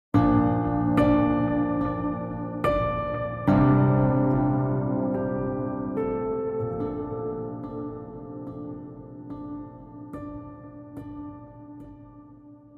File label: Sting